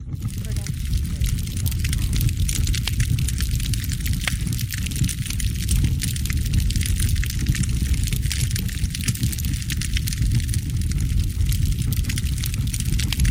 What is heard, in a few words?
Large Fire; SoundsCrate